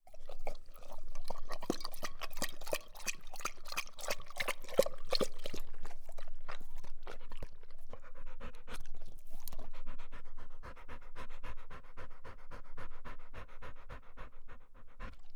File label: INT Dog Drinking; Coll Anderson